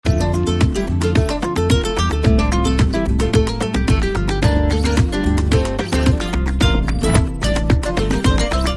Trailer Love BGM Ringtone; Lakshya

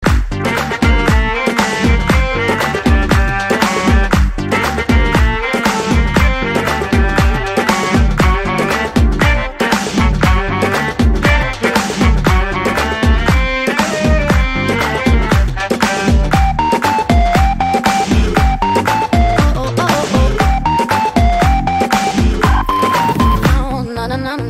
BGM

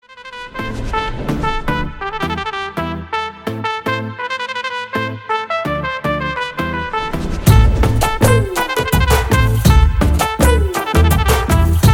Instrumental

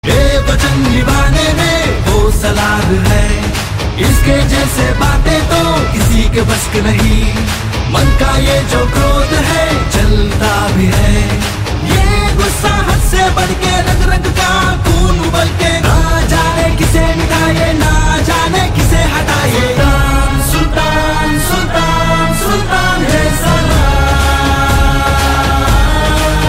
Title Track Hindi Song Ringtone

